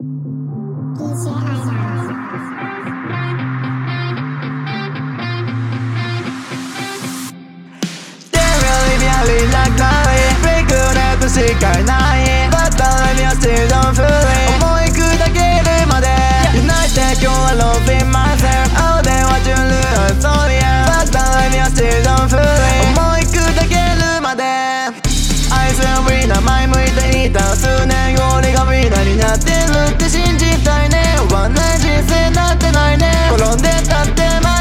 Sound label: Rock